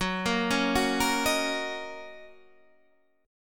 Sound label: Gb 6th Gb down; Listen to Gb6 arpeggiated